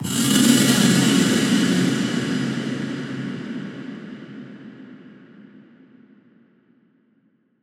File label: Atonal Depths, Psytrance; Ghosthack; Psytrance Visions Volume 2